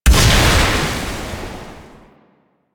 Projectile Death Large; Weapons; Warframe; Duviri; Incarnon Adapters

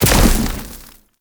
Casting Device On Damage Impact; Weapon Impacts; Player; Casting Devices; Ode Casting Device

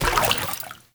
Walk Water, Footsteps; Enemy; Lady Deora

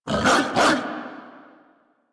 growl idle bark, Kubrow; Enemies; Warframe; Tame

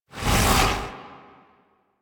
Slash Dash; Warframe; Warframes; Excalibur Umbra

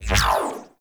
Jukebox Exit; DE; Warframe

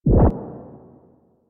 Corrupted Notes O; Warframe; Bard Quest; Bard Boss Fight

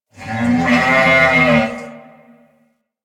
Ram Ambient Call; Animals; DE; Warframe; Quests; Duviri

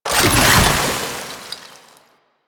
Alchemy Grenade Deposit Cold; Warframe; Entrati

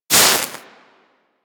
Axe Projectile Impact A; Sundial

